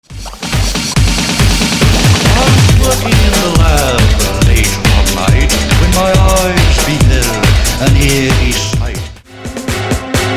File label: Halloween Tracks Redrum; Clean Dirty